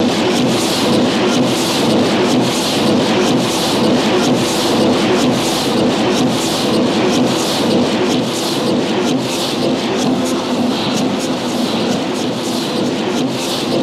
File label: Industry Hvy Loud Am, factory